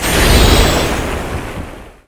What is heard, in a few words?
water shild